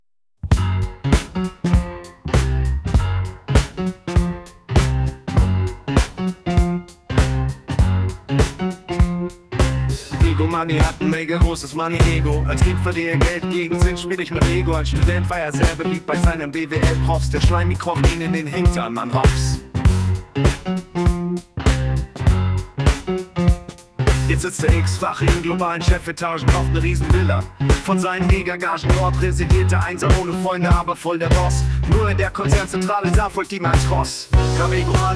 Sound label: JazzRap